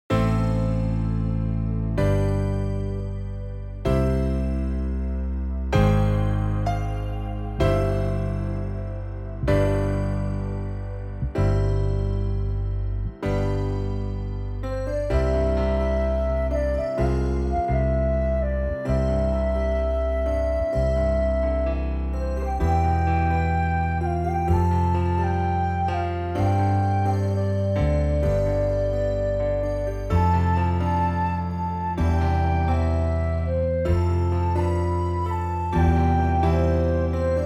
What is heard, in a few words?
backing track